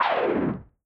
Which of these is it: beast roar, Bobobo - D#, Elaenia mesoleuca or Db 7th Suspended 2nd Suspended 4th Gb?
beast roar